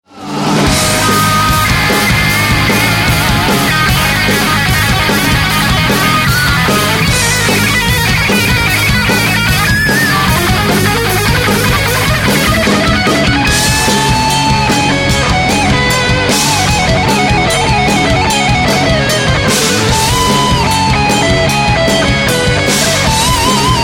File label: Guitar Solo